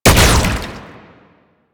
Proto Infested Brachiolyst Projectile Fire; Warframe; Abilities

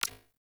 UI Corpus Theme Select; DE; Warframe

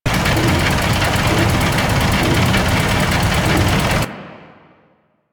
Settlement Large Fan Loop; Ambience; Warframe; Grineer Settlement

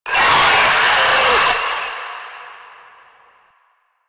Group Death Scream; Effervon Lasrian; Warframe; Quests; Nineteen Ninety Nine

